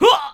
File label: Hit